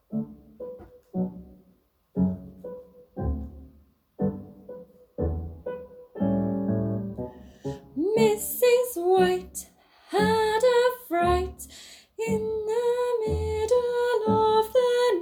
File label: mrs white song with piano; in harmony teacher resources; eyfs and key stage 1